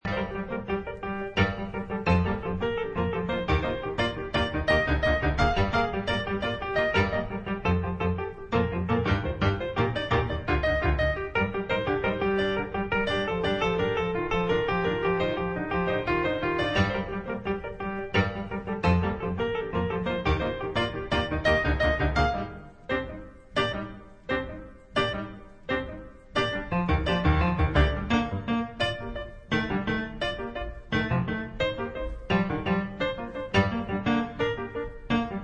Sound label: acoustic version